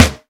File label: studio snare drum sample E key; snare drum samples